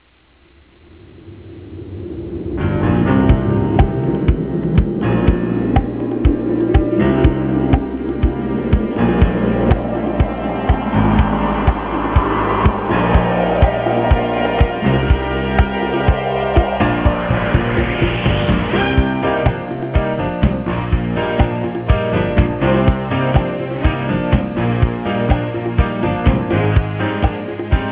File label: wind